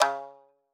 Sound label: marimba shamisen; ゆめ2っき